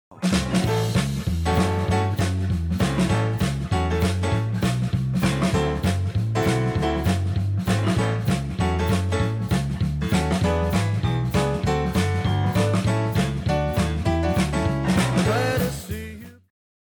dance intro

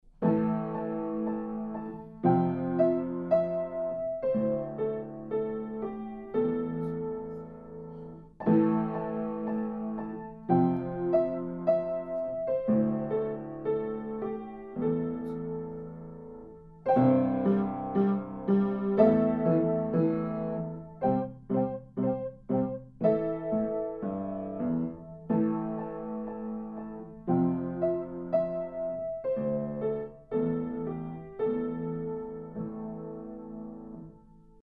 Forest Drums

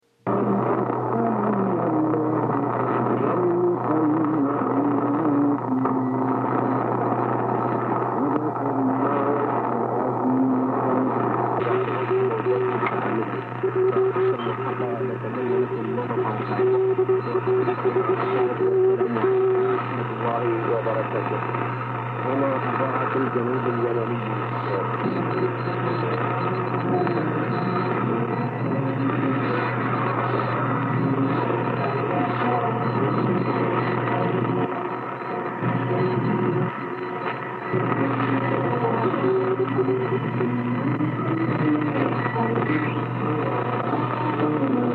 Yemen Radio (Aden) 5,060 khz

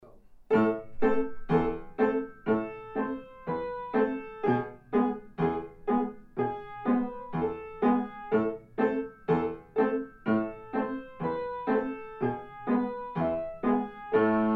Russian Sailor Dance

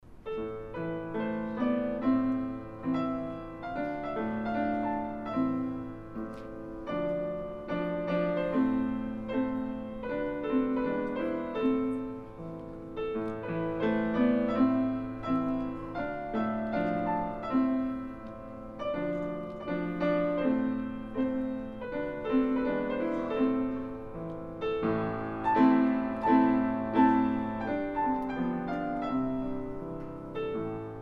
500 Year Old Melody

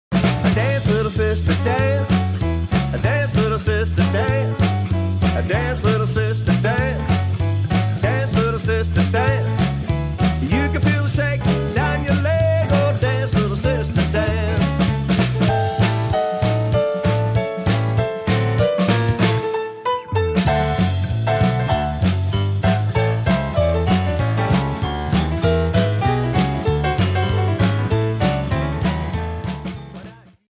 dance chorus ruff

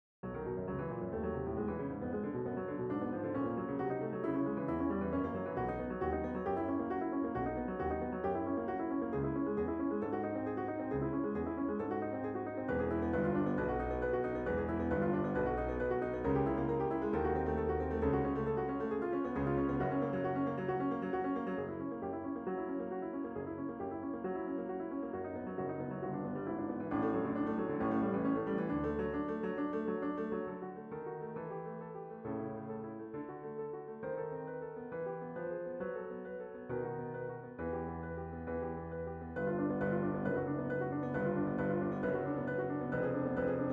low just piano; Schubert; Rastlose Liebe

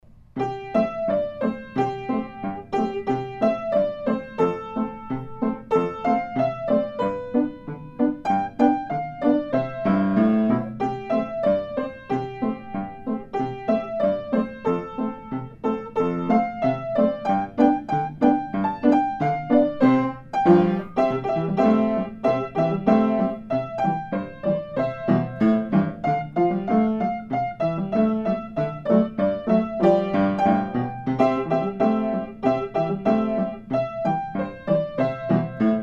Jingle Bells

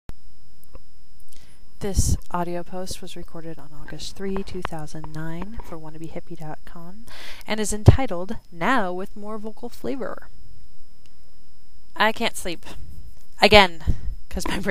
More Vocal

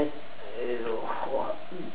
EVP

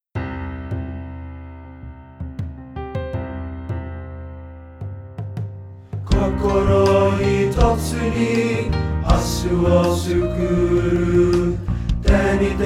Coke building tenor

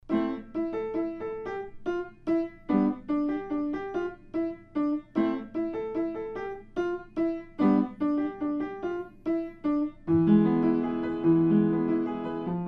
Spanish Dance

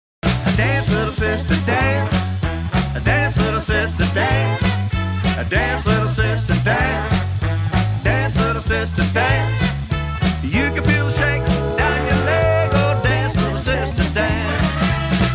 dance chorus

